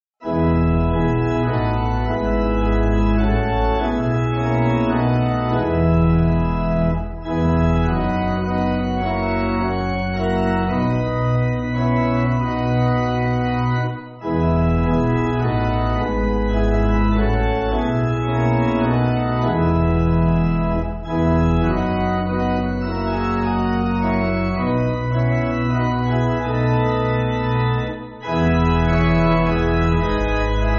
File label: Pipe Organ, church music